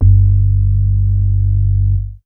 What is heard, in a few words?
MOOG BASS G2; Best Service Dream Experience